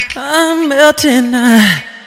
spy laugh short; spy jackson

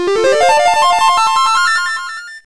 lap; tf2ware ultimate

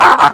heavy laugh evil

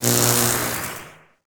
storm motion; chiabihamaru; cv project; invading executioner; zerosiki; slender

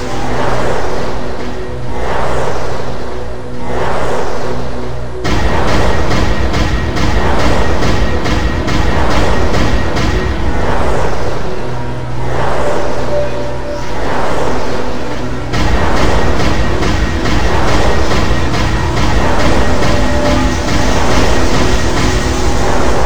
thumper chase bgm; lethalcompany; zerosiki; slender

